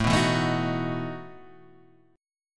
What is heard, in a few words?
A 6th Suspended 2nd Sharp 11th, guitar; A6sus2#11 Chord {5 2 2 2 4 2} strummed